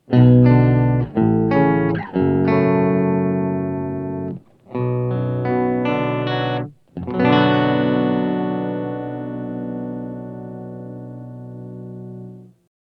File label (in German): Mooer Harmony X2 Sub Oktave